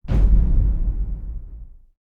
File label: Click